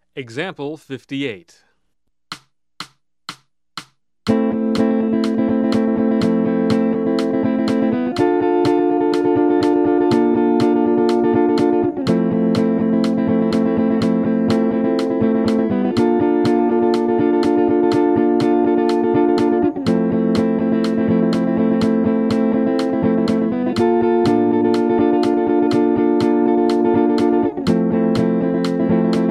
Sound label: Jazz